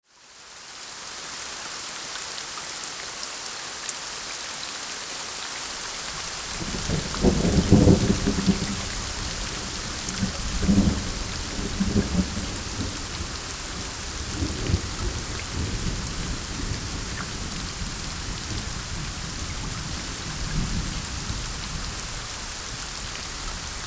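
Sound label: distant thunder with raindrops, nature sounds; Zvuki prirody; Distancionnoe grom s kaplyami dozhdya zvuki prirody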